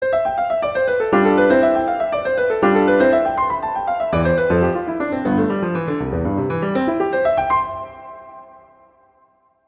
Prelude III C major; Clementi; Preludes

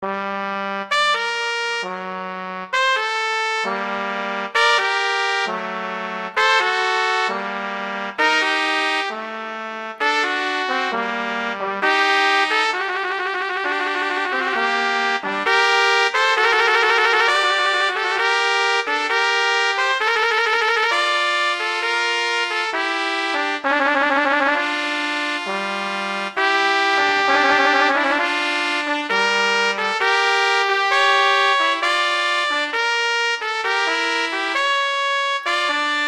Adagio; Telemann; Canonic; Sonata 1

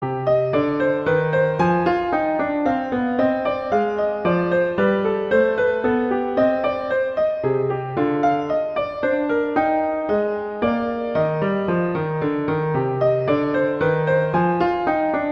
Menuet; Bach; French Suites; Suite II

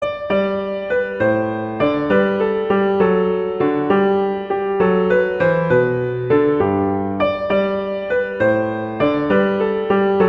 Sussex Carol, Christmas Beginners

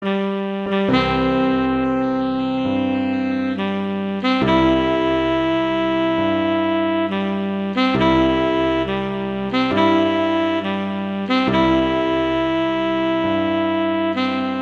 Taps, A Sax Pf; Patriotic

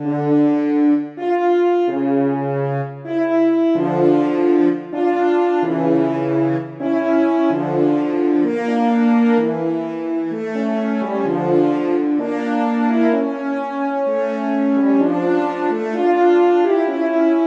Adagio; Telemann; Canonic

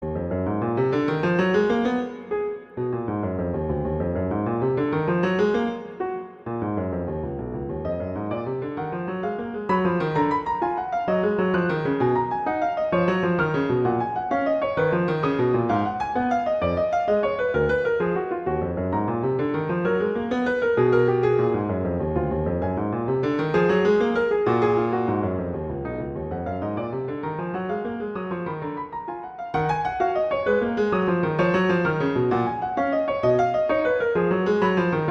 Exercise - Eb minor; Clementi; Preludes

